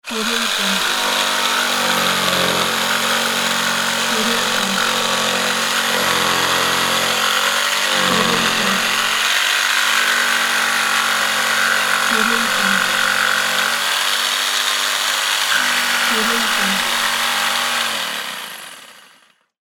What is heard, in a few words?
jig saw